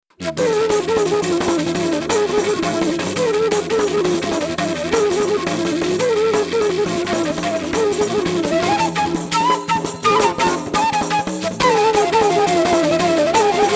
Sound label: drum and flute